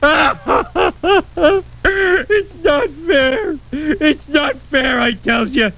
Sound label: lose